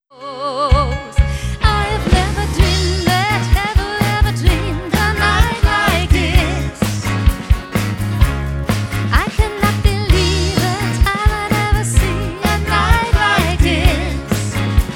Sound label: Cha cha